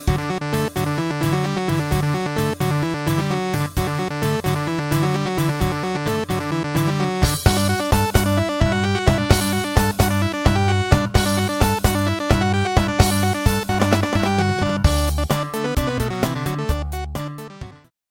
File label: LEAD Gritty Lead; Synthractive; syntheway